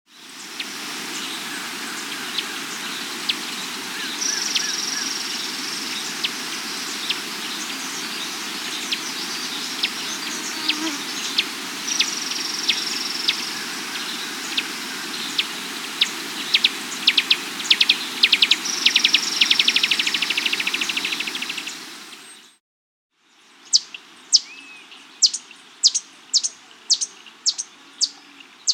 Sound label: Common Bush-Tanager, Nature's Songs, S. America